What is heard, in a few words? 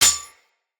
Melee Sword Impact Generic